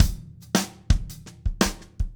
shuffle, drumscores

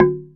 Tongue Lo; Multisamples; Digital Sound Factory Planet Earth

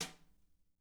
BRSNR, drum

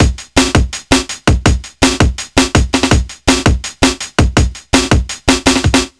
165 break, Explosive Jungle; USB Soundscan vol.05; AKAI; 90s sample CD

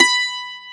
Mandolin B4; Multisamples; Digital Sound Factory Planet Earth

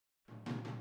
Boogaloo - Room ST, 82 BPM; Drums; Intro; Sonic Foundry; Drums from the Big Room The Mixes

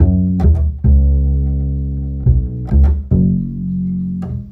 Acoustic Bass

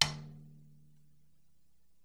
MID TOM TAP

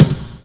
BD, Explosive Jungle; 172 LOOP; USB Soundscan vol.05; AKAI; 90s sample CD

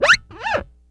SCRATCH, EFX SCRATCHS

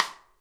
SNARE; Sonic Reality; Interactive Drum Kits

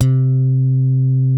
DYNA MID C4, DIGITAL BASS; Music Store - Claudius Diemeter Schwerlos

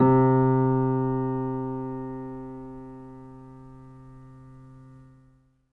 L, Acoustic Piano, PIANO STEREO; InVision Interactive; Miroslav Vitous

